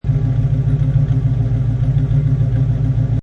Mustang 68 Fastback Engine Idle Loop Exhaust Perspective; Car Sound Effects